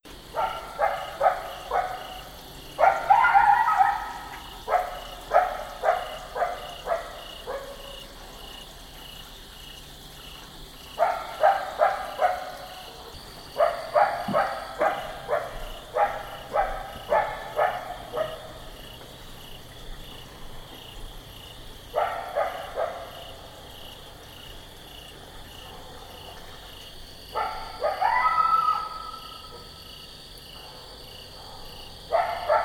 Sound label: coyote bark howl